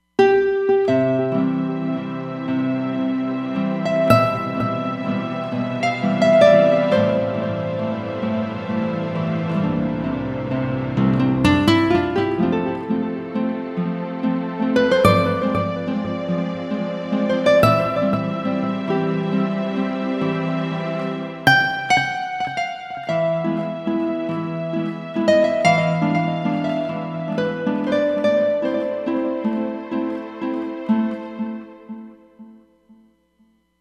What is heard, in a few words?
Roland Fantom XR; Amore Story